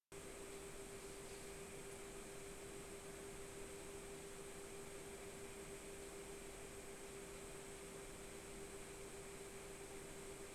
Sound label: Fan Noise Comparison VAPOR-X R9 280X TRI-X